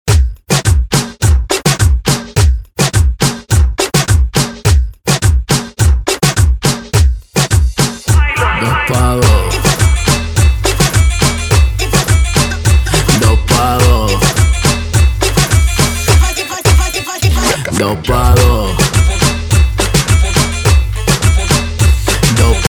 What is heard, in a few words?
remix bpm 105